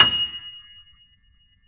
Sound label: F 6, Keyboards Acoustic, GRANDP ST; USB Soundscan vol.17